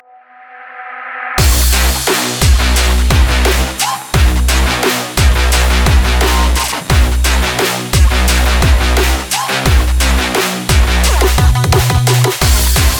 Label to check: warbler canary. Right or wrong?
Wrong